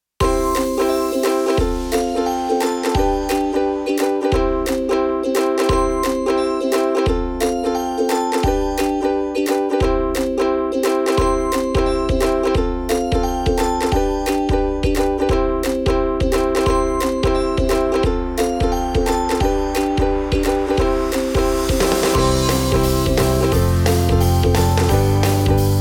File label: Pop